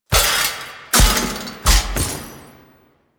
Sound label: Riposte Finisher Foley; Enemies; Finishers; Dax; Warframe; Duviri